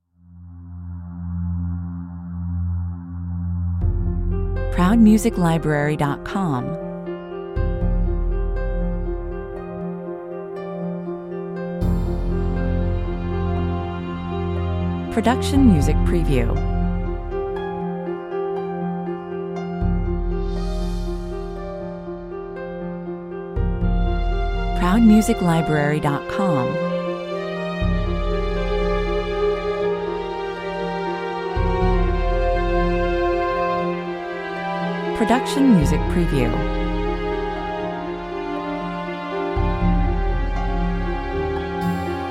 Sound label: downward spiral 60 bpm